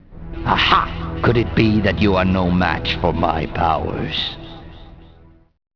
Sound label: lose